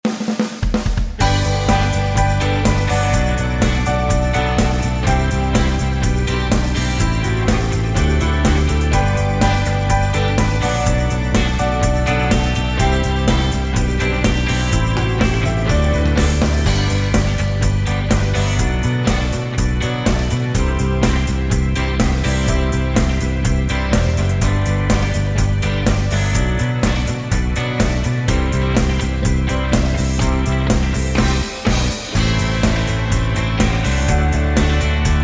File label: No Backing Vocals